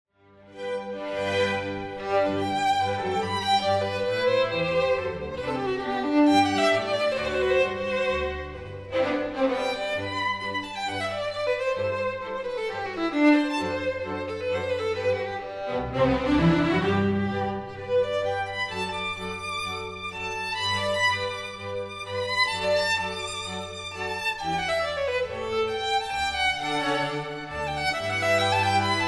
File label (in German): Violinkonzert G-Dur Allegro moderato; Haydn; Hob VIIa 4